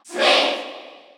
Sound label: Cheer Korean; Snake; SSBU